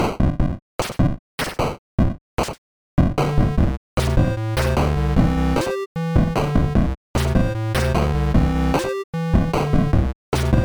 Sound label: Happy Day 8 Bit Loop